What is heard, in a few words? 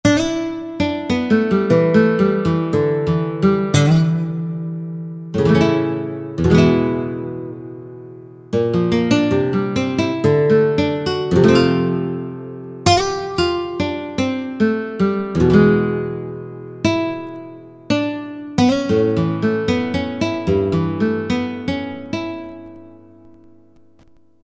Rolin' Fingrs, Roland SRX-03 Studio SRX